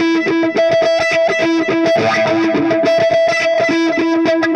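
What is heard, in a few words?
Delay Wah, Guitar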